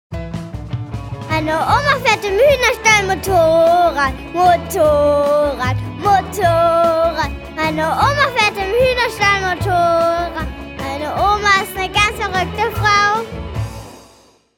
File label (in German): Gesang